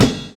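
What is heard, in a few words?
PERCU RIDE; Univers Sons - Kitch 70s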